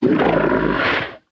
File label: stomper idle, enemies; Factorio